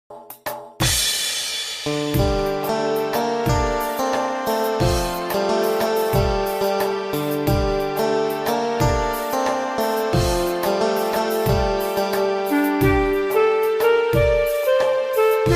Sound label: hindi love song ringtone romantic ringtone cute baby ringtone